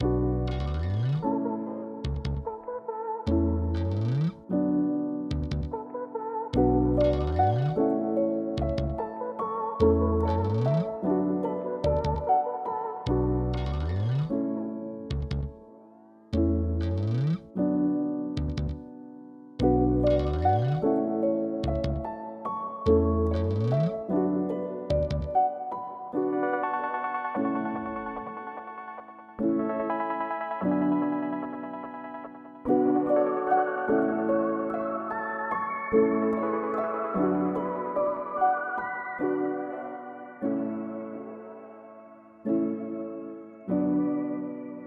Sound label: 147 BPM F# min; RODDY CASH